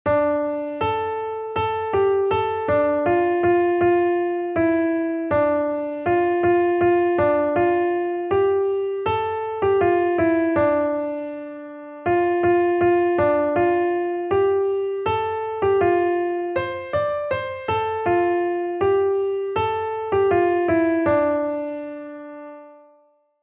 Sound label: melody; jesus christ our blessed savior